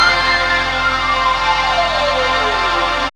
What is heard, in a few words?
SYN TV, Dance Synth